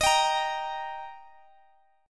Eb Major, down, Guitar, DADGAD

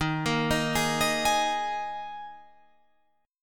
D sharp Suspended 4th, Guitar, Drop D

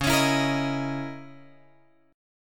C sharp Minor 6th Add 9th C sharp

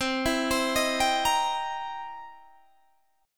C 7th Sharp 9th, C, down, Guitar, Open G

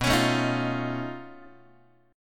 B 9th Flat 5th B down Guitar; B9b5 strummed; JGuitar

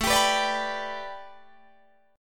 A 9th Suspended 4th Guitar Drop D, chord; 19,22,21,19,20,19

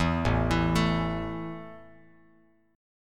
A 5th A down Bass Standard; arpeggiated

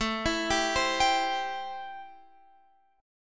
A-Minor 7th A Guitar Standard; x,12,14,12,13,15; arpeggiated; capo=9

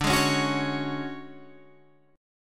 D Major 7th Flat 5th, D, down, Guitar Open G

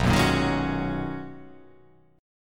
B Major 13th B down

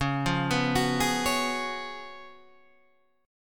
Db 11th Db, Guitar, Drop D

